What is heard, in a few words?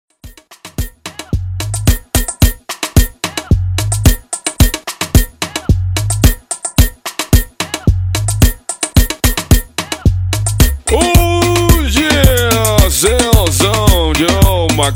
Afrobeat 110Bpm